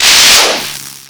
vort attack shoot; hl2 npc; ep2

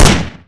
ar2 fire; oicw; hl2 weapon

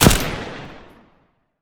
famas; arccw go

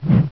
claw miss, zombie; hl1 npc

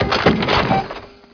25mm reload, tanks; hl1 npc

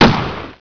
gun

